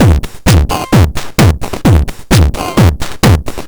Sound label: Crushed Beat 130BPM; musicradar